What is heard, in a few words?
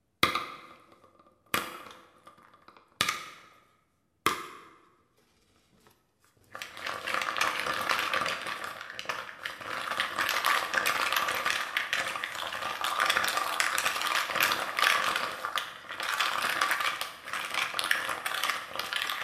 walnut bowl hall; thingaday